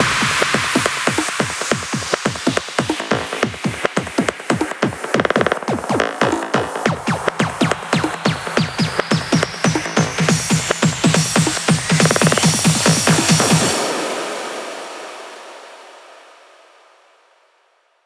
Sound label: 140BPM 8Bars, HandsUp Trance Fills; Renegade; Vengeance Samples